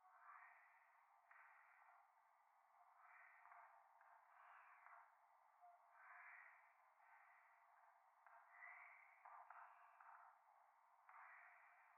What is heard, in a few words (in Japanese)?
右耳 密着 吐息 電車; 夢の中